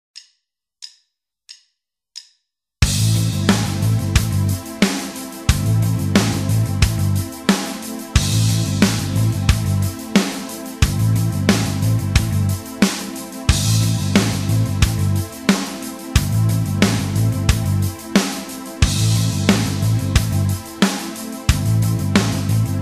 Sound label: backing; Guitar Techniques 2017 Issue 266 March